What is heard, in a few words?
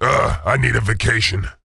die; sven; ssam